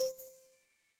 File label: feedback success